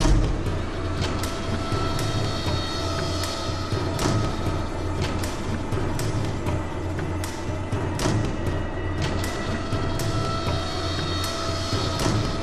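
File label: PERCUSSION loop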